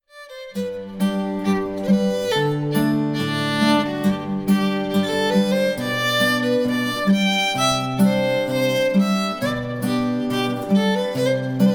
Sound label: playalong samples